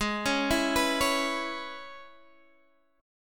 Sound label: E 6th, G sharp